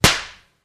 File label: slap